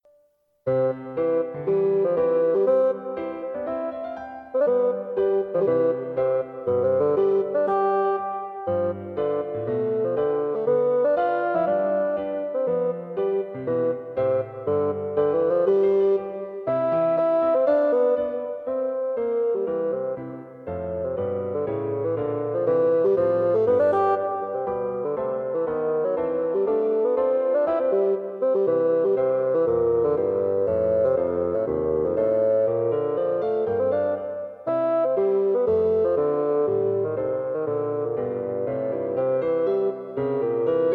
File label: Fireside Suite, Bassoon; Bassoon suite for bassoon and piano; The audio clip is No.3